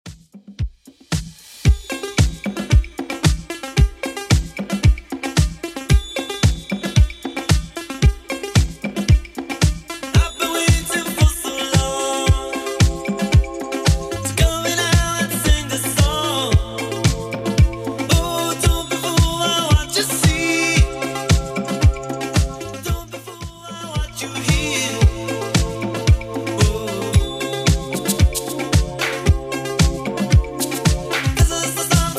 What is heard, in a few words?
80s Redrum 113bpm